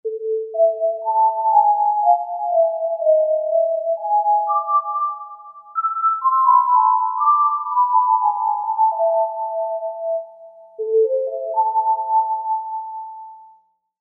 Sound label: Pure Pipe